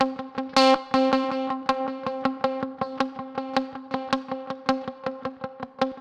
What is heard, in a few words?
E Rhythm